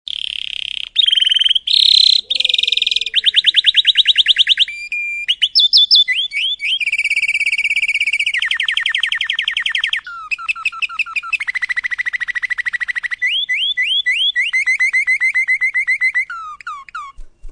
warbler canary

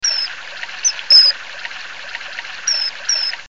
kingfisher bird sounds

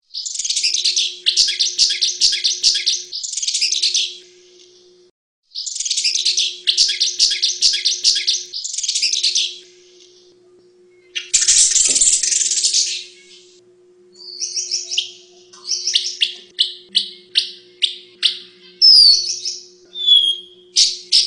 paradise whydah

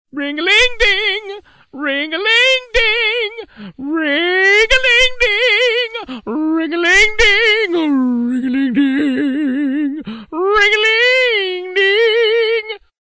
ring a ling ding

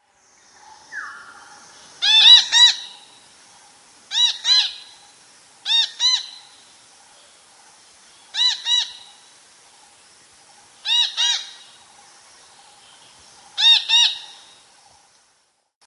red breasted parakeet calls

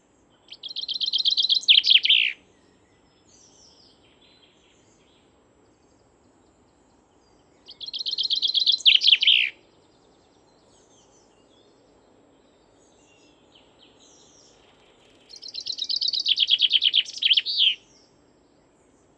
suara chaffinch

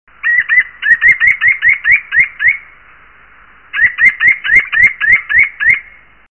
athene noctua

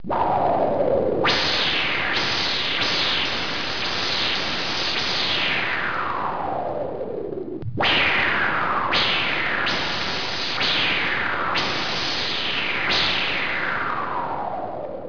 scifi

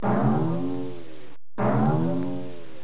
musical

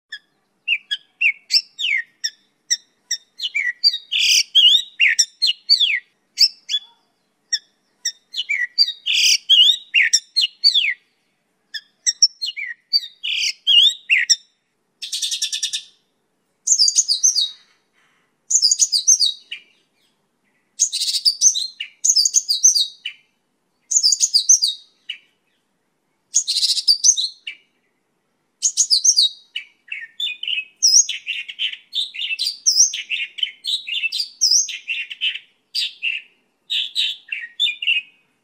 mongolian lark

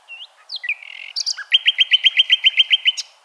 nightin

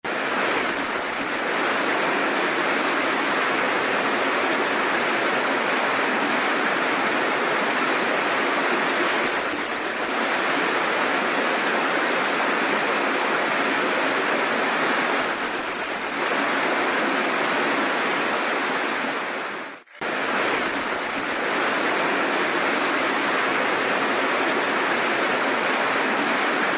grey wagtail

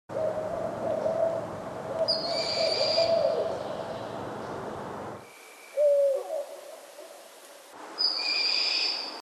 strix varia

